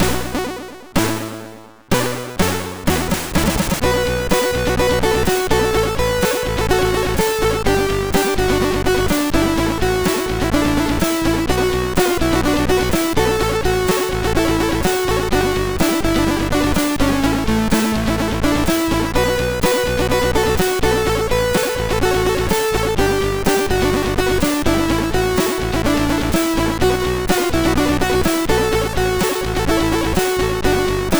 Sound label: Rain MOS6581R3; Moon